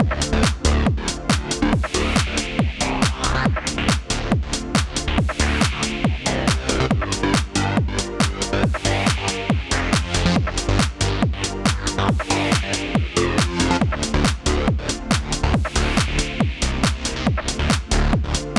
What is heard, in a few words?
Trance Loop